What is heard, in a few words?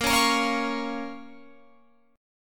Bb Minor Bb down Guitar Open F; Bbm strummed